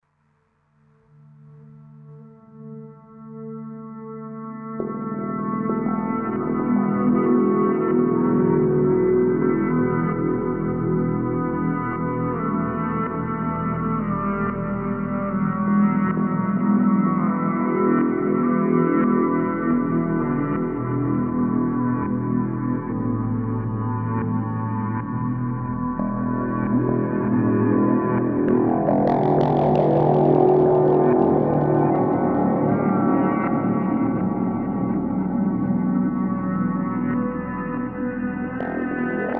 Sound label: 080 Guitar